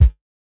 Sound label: mod kick, Mod Drums; Synths and FX; neuro; Sinistarr